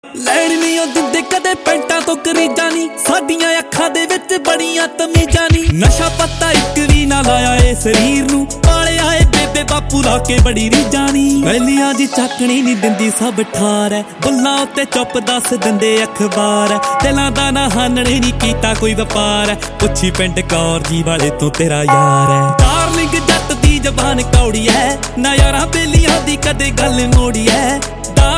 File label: Ringtone